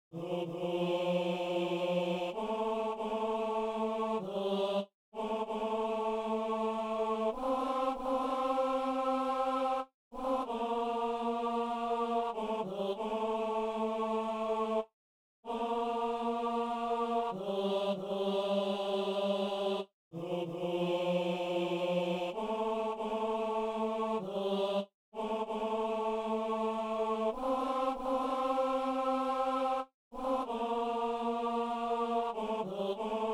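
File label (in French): ténor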